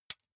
midsize metal thin hit; industrie-wirtschaft; baugewerbe

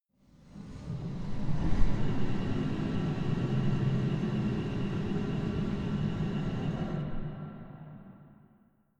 engines working machines, drones

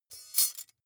midsize metal thin clank catch

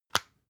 floss open hard; haus-garten; badezimmer

